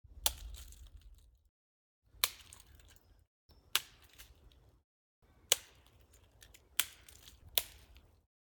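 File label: throwing eggs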